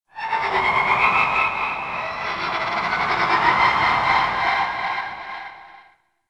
Citrine Void Connector Shriek; Warframe; Citrine's Last Wish

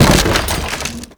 Wood Bench Destroy; Warframe; Ambience; NineteenNinetyNine

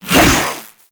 Briefcase Katana Whoosh; Warframe; Weapons; Corpus

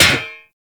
Melee Hammer Impact Generic; Hammers

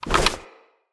Remove From Back, Foley; Tenno Alt

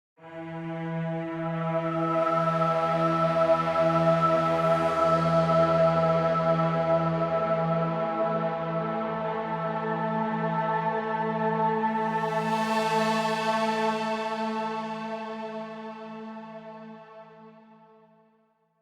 Hangar Ambient Random; Ambient One Offs; Warframe; Railjack

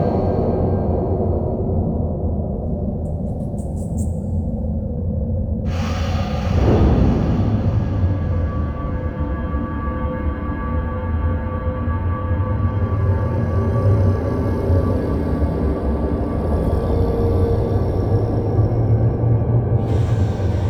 Interior Background Amb Loop; Warframe; Duviri

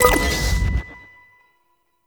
Hack Minigame Click B; Duviri; Warframe

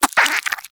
Vocal Tranq Grunt; Warframe; Hunting Critters; Nexifera

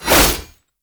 Whoosh; Warframe; Melee; 1999 Skana

